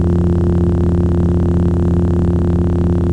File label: saber loop; lightsaber